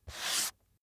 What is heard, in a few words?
book dust off staged single